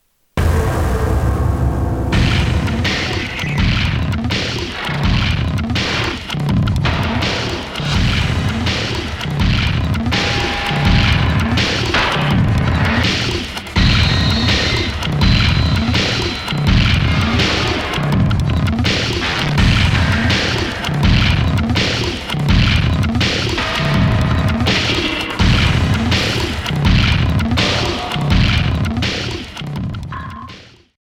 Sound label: Countdown; The Conduit; Wii